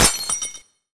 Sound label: crash